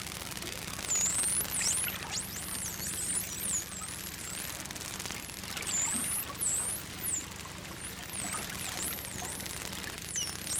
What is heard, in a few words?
atlantic spotted, atlantic spotted dolphin; dolphins